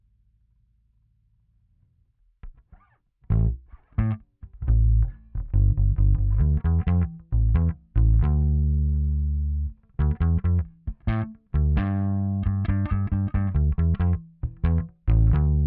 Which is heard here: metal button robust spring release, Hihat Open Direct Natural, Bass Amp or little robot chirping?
Bass Amp